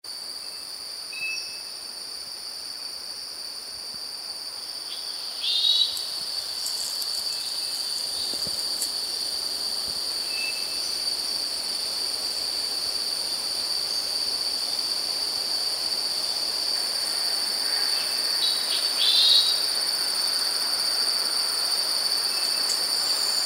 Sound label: whitehead's spiderhunter